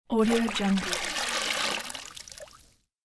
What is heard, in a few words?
putting off pot from water